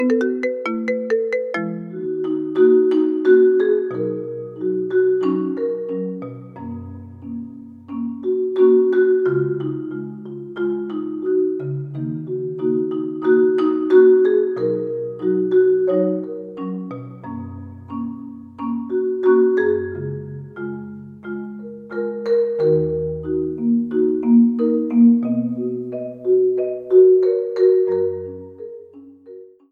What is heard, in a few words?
marimba